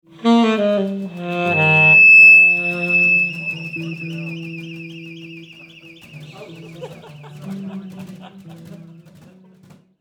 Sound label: Feedback, Orchestra; Cube Orchestra